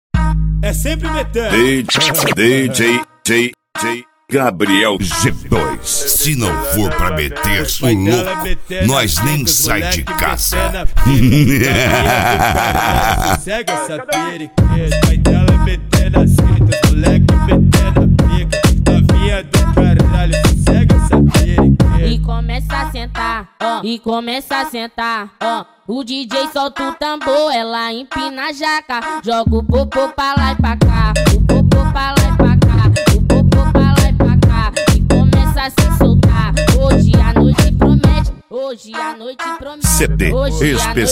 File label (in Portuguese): especial funk e eletrofunk